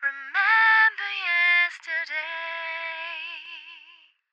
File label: On A Star 65bpm F, Vocal; Zero-G